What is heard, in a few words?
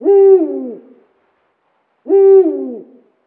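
owl distant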